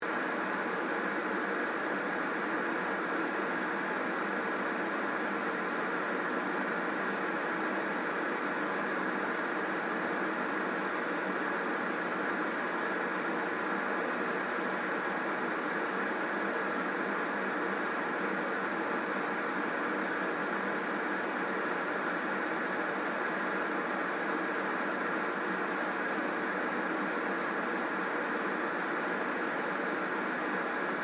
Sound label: Television Static, Technology, Television, Static, Large, Tube, High, Pitch, Tone, Loop; BlastWave